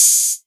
808 Hat O; Novation Drumstation

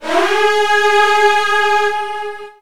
Virtuoso Strings G#2; ILIO; Kirk Hunter Virtuoso Strings VOL-2

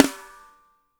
PIC SNR; SNARES; Spectrasonics - Burning Grooves CD2

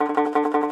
T. BANJO, Tenor Banjo, Plucked Strings

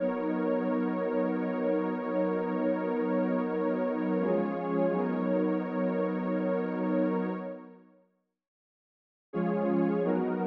pad B, Smooth RnB, Ebmaj

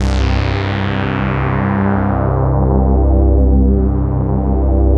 SYN K-2000, Roland

